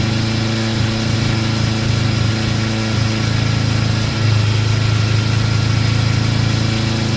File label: VW Polo DRIVE Steady 3500 rpm ENGINE; Blind Drive